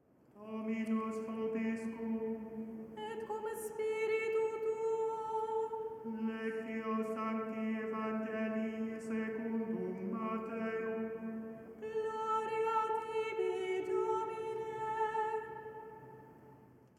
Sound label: introduction to gospel